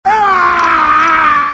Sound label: death; hl2dm